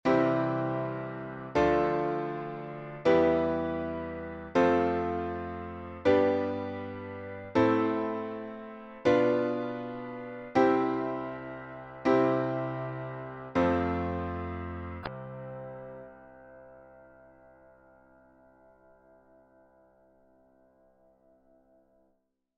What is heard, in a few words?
Full Diatonic Major C2